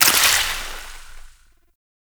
Void Hit; Warframe

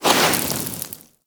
Sword Elemental Fire Whoosh; Enemies; Weapons; Warframe; Teshin

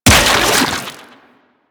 Rifle Charged Fire; Warframe; Infested; Quanta

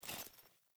Foley Movement Armour; Characters; Enemy; Melee Grunt; Sinecure

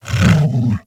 interact sleep wake, Kubrow; Enemies; Warframe; Tame

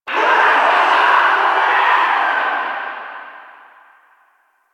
Exterior Foreground Infested; Warframe; Ambience; Nineteen Ninety Nine

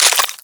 Reload C; Kuva Hek; Weapons; Warframe

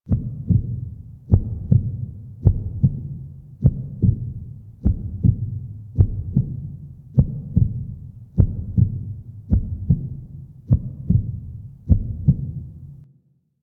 Memory Transition Heartbeat Loop; Warframe; Sacrifice; Sacrifice Arsenal

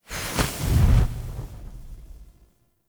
Blaze Armour Loop End; Powers; Fenn

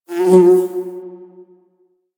Exterior Foreground Bug Flyby, Ambience; Warframe; Duviri

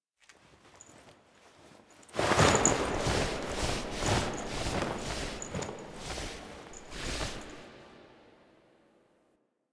Drifter Foley C; Warframe; Quests; The New War; Sealab; Hunhows Lair; Hunhows Lair Part One

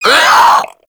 Infested Crawler Pain; Warframe

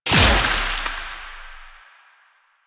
Explosion; Warframe; Weapons; Moa Pet; Multron

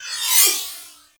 Swarm Cannon Projectile; Warframe; Weapons; Corpus

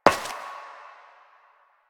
Step Metal; Warframe; Koumei

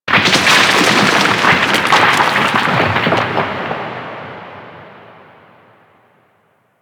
Rock Fall Large; Ambience; Warframe; Corpus Outpost

